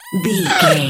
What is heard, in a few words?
goblin fairy effort push fast